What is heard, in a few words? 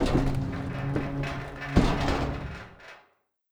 door large metal close end; Rust